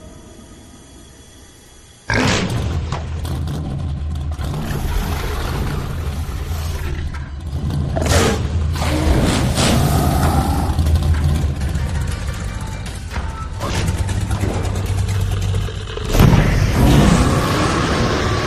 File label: machine sound